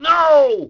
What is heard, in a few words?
bail vox; Tony Hawk's Project 8; XBOX